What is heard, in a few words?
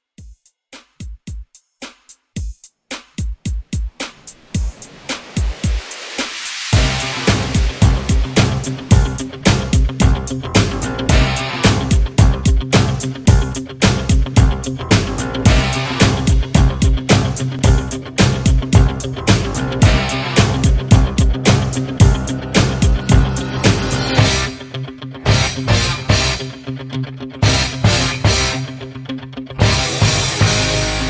80s Rock Hype Re Drum 110